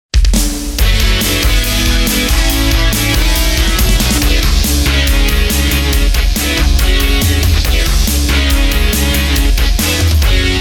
Korg Pa3X new sound Hard Rock

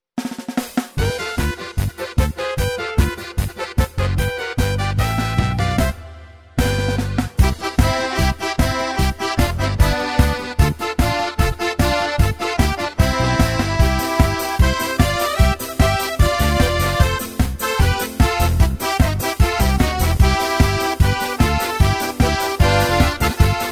steir polka

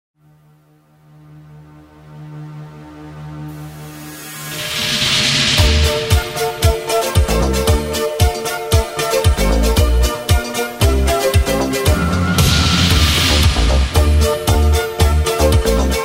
2 (Instr), korgpa

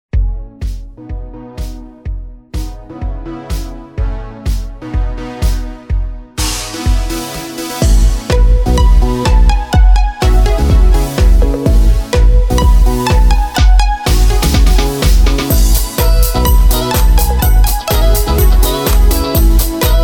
Korg Pa3X new sound Dance; Dance Chart 1 DEMO

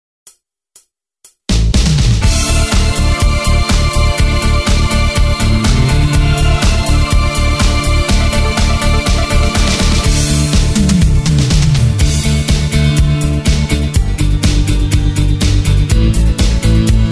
Korg Pa3X schlager; Chart schlager 2 DEMO

